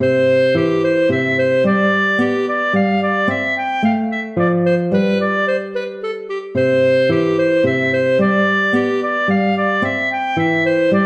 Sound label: tempo minuetto; hook james